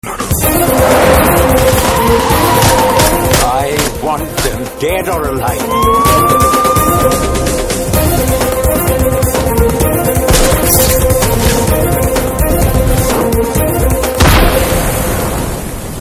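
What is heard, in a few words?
Trailer Chasing background music ringtone; Wild Dog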